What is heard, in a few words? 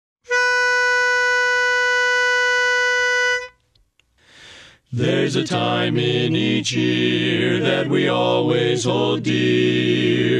Bass